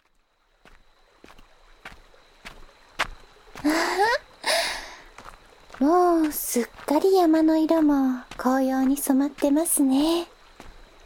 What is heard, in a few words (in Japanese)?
耳舐め 吐息 追加; 妖艶の湯 金髪エルフの少女の手籠めにされちゃう…～Sに目覚めた新人さんのいいなり♪～; 電車内で恥ずかしいコト…させちゃおう♪